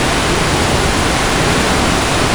waterfall; Soldier Of Fortune II Double Helix; XBOX

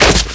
bail scrape; skater; Tony Hawk's Project 8; XBOX

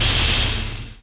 Steam; Finding Nemo; XBOX

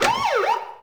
medkit; hawaii

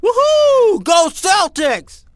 "Celtics", fan; NBA Inside Drive 2004

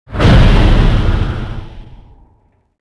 huge platform stop; movers platforms

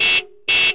honda h2; Chase Hollywood Stunt Driver; XBOX

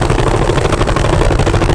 roll tile, terrains; Tony Hawk's Project 8; XBOX

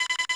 binoc confirm Beep; interface

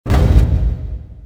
lift stop; movers; doors; Soldier Of Fortune II Double Helix; XBOX

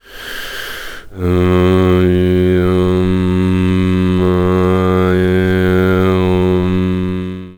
meditation ok, Scenery; Decors

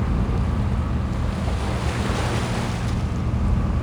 shoreline; Soldier Of Fortune II Double Helix; XBOX

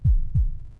Heart Beat

